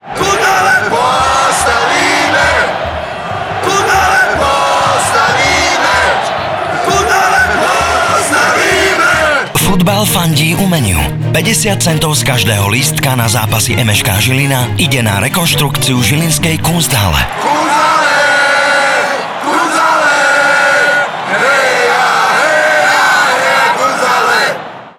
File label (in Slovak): chor